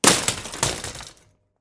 Bicycle Impact Floor Or Ground Fall Metal Hit Rattly